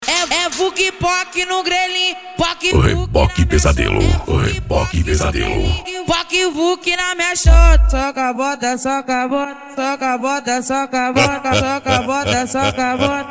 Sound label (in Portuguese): sound car